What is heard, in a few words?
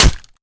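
melee character gun small steel; viper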